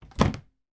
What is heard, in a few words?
storage box plastic small lid close; foley